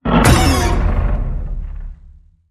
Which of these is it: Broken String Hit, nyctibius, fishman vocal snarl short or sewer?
Broken String Hit